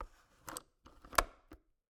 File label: Cabin, window, latch, flip, lock, close, wide; Eiravaein Works; Vaeyan IV